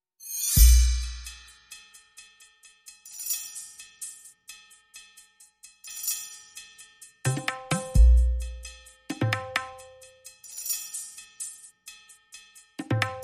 Perc and Pulses

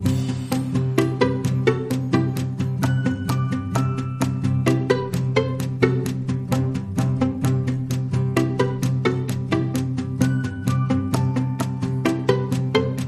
Clock Is Ticking

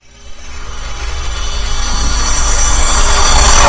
Reverse Magnet

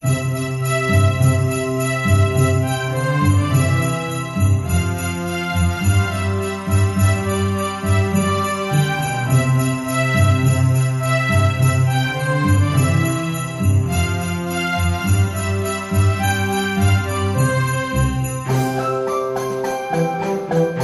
Jingle Bells

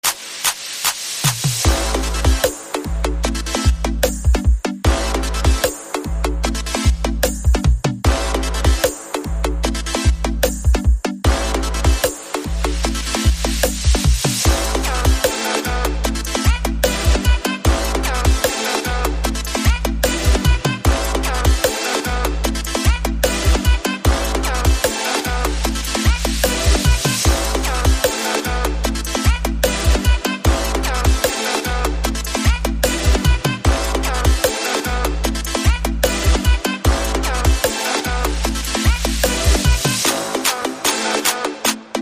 Techno

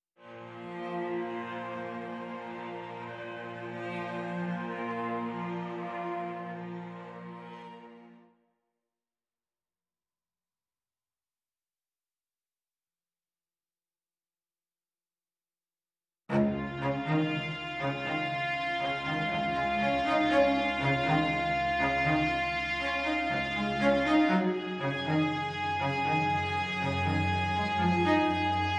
Strings

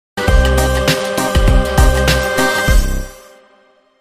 Bar Mitzvah (Modern Remix)

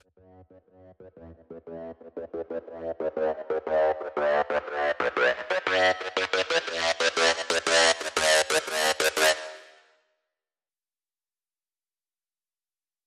Synth

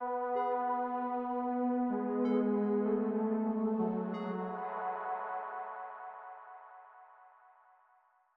Sting

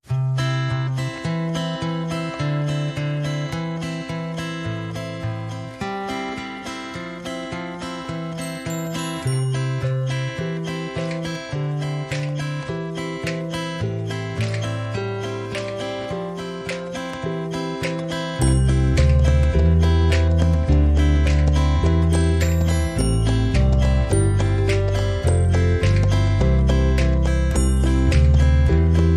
No Accordion

